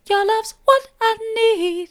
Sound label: soft, vocal